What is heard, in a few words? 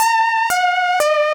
Beat